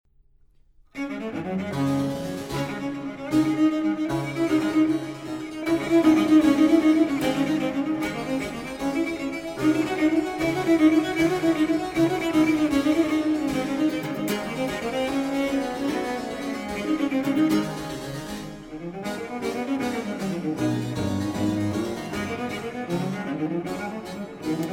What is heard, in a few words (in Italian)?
Sonata III a violoncello solo; Domenico Silvio Passionei Sonata III a violoncello solo e bc. en re menor: IV. Allegro; arcantus Musikproduktion; El Galeón